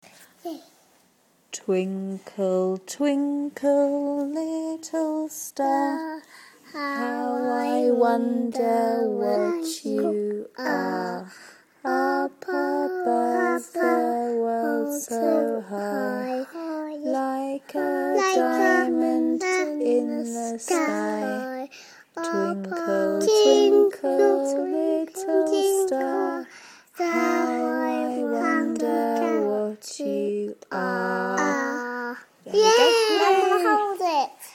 twinkle twinkle little star

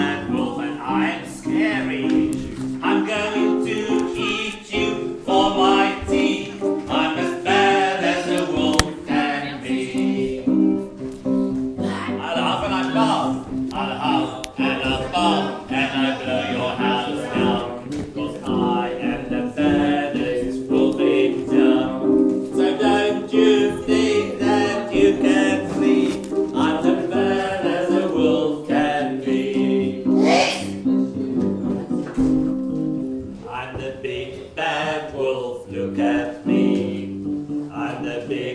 class singing

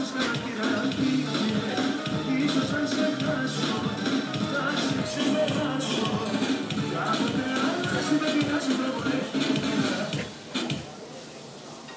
awkward restaurant song

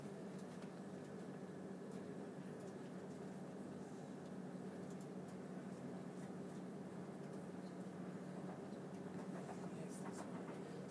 on a train soundscape

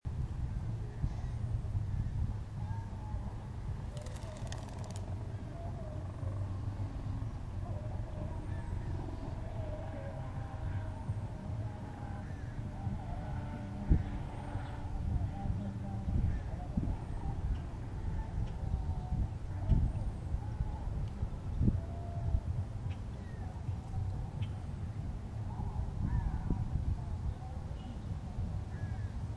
music in the distance

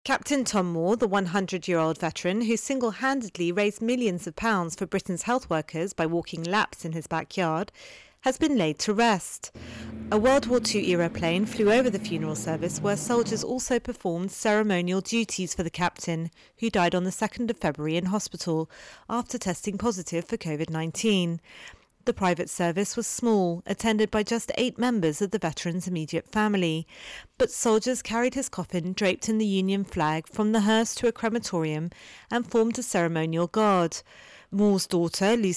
WWII plane fly past; honors captain tom moore at funeral

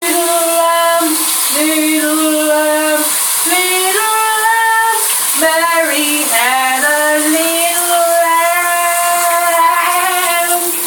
shower singing

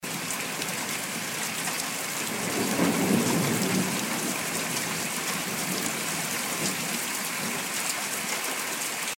british summer

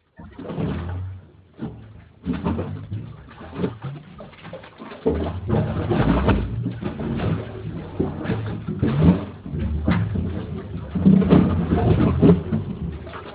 waves in the storm water drain

